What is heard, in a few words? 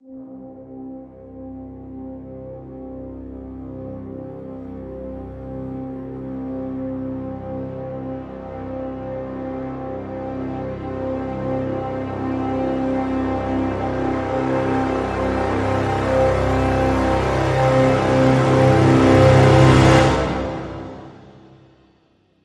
Brass Swell Full (C)